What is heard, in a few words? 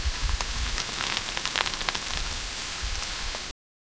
flag rustling; breeze